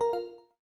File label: Speech Sleep; Windows; Glass